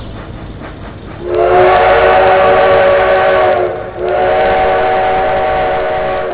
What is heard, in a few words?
train, railroad; durango